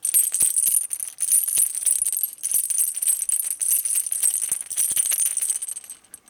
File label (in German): Klingel